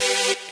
saw

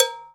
Cowbell

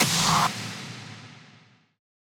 Spark big snare

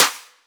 snare trap

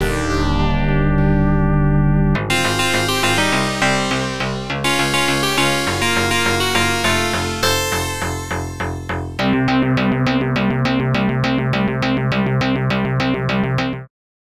fail sound; osu

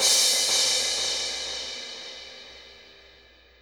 soft hitfinish

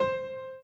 Piano Chord

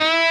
Bend half

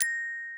gloken

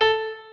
piano

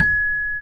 Celeste G#4

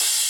cymbal; osu

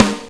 Snappy Snare

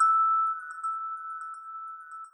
mid chime

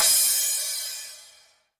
cymbal

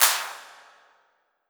clap layer

